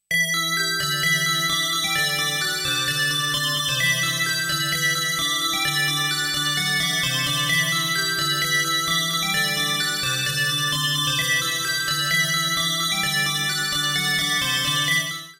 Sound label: bell; Krystal Bell